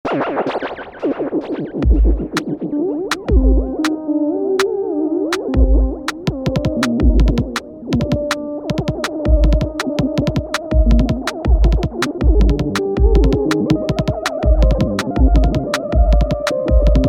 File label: late night jam